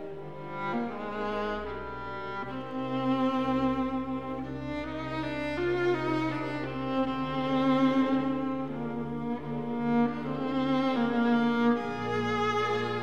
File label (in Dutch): Altviool; orkest